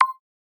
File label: Button Click